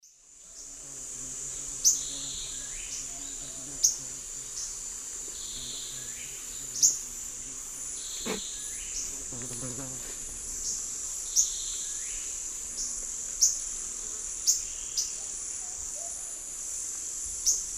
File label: Myiobius atricaudus, calls pair; S. America; Eastern Lowlands